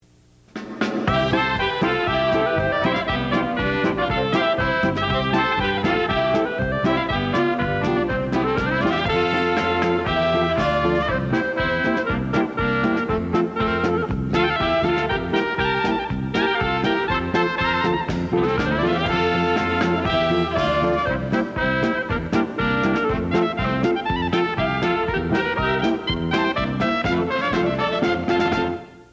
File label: polka